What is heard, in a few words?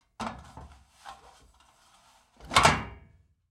Metal Grate Open; Fairlight Sound Lib